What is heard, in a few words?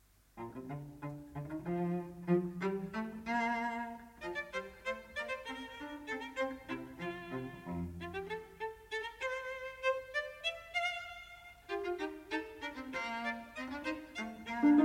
Piano Trio No. 7 in B-Flat Major II. Scherzo (Allegro); Op. 97 Archduke